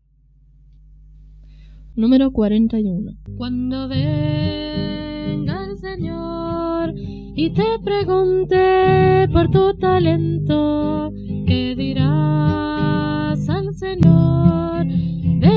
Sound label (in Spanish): Coro, himnario niños